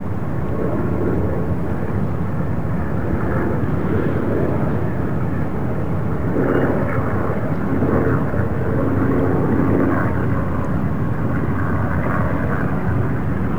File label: city helicopters; ambient; Project Gotham Racing